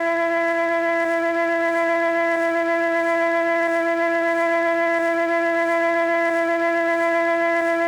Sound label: flute A, FLUTES